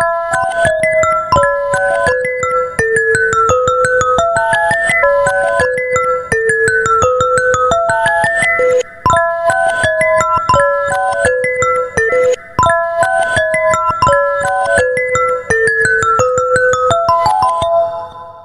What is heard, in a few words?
cool synth; ringtones; samsung galaxy note 10